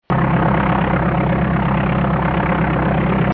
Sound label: Prop, aircraft sounds